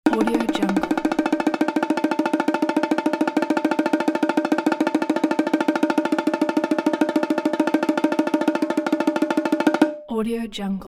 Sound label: long bongo roll